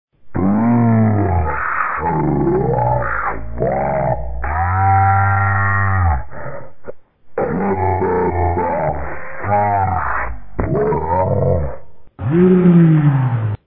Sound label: bonesaw; vapesquared